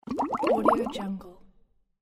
funny bubbles

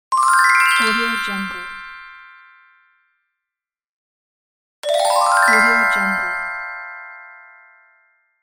magic wand; medusamusiclab

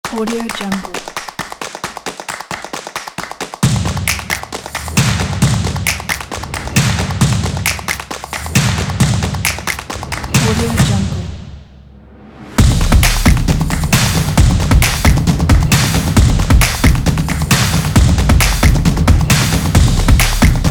snap fingers

